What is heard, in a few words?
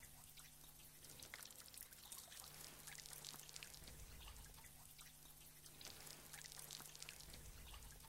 cave water; gamerip